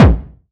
BD, BASS DRUMS; EdgeSounds

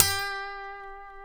A, funk guitars

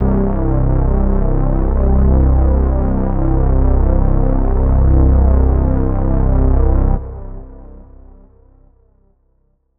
Deep Atmos, Dark Atmospheres; Zero-G Sound Sense Grime Scene

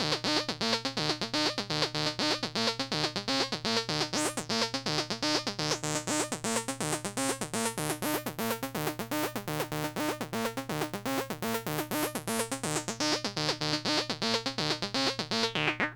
RAVE 123, SYNTH SEQ; AMG; Global Trance Mission VOL-2

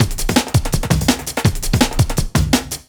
166, Drum Loops; SkipToMyLou; M8; Electronisounds

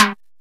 CHES TIMB; TECHNO-INDUS; Greytsounds VOL-1

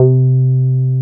303 SQR C4 P, SQUARE BS

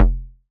BAS F1, Yamaha TX81Z synth bass

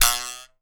BUZZ STIC, African percussion; Voice Crystal - Steve Reid Definitive Percussion

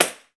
TAMBO, Tambourines

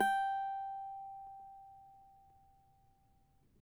CAVAQUINHO G, Guitars; Spectrasonics; Hans Zimmer Guitars VOL-2

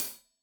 Hat Tight, Hi-Hat; Deeply Sampled Drums

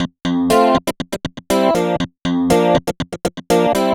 FUNK FM CLAV; East West; Twisted Textures-BT

